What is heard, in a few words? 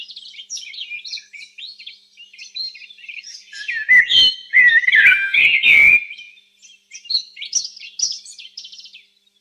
Morning Forest Birds Chirping; forest jungle ambience